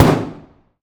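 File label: lmg sup urb int close rear player; atmo; gmod; viper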